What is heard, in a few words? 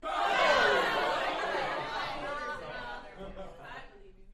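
Crowd 15 Interior Rowdy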